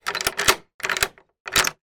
door shed keys lock or unlock

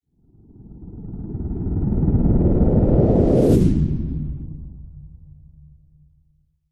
science fiction spacecraft fly by fast

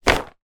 twister game sheet folded pick up

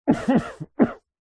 human male man cough into cloth or arm muffled; zapsplat

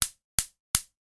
household oven gas stove ignition; sound effects one

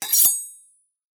Gore Cut Slice; Just Gore; soundbits

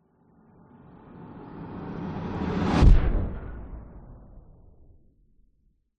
sound design whoosh heavy hard fast impact; zapsplat

